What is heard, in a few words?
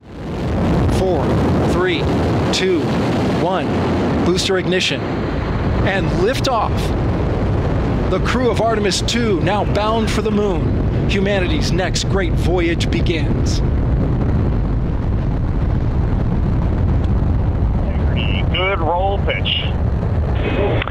liftoff; artemis ii